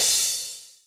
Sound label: drum hit finish